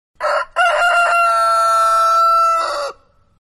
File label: Rooster; Birds; Animals; Manhattan Production Music